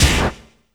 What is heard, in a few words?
SD, 170 BPM; Ueberschall; Drum & Bass Resonance